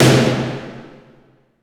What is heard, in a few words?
SNR HUGE, Snares; Roland - Rhythm Section